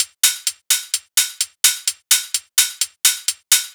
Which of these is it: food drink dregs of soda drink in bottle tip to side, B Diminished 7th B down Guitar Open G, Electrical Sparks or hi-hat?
hi-hat